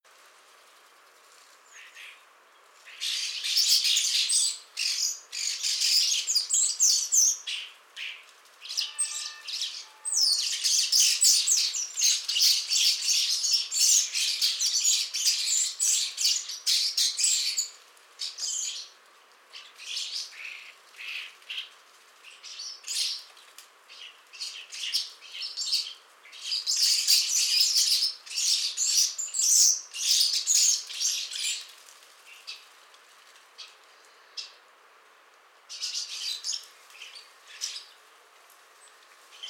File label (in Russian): skvorets sturnus vulgaris; peniya ptits